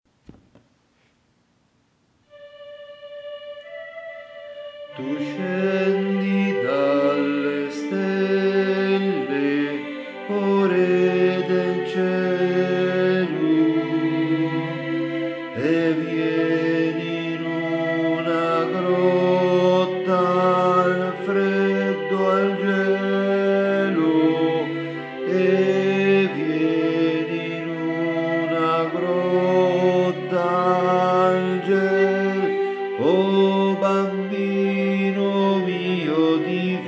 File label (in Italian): Tu scendi Alt; Tu scendi dalle stelle Alti